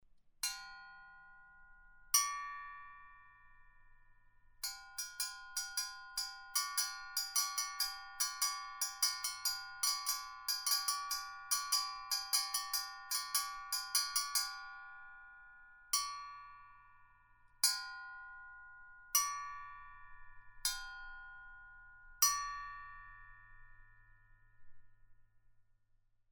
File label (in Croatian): zvona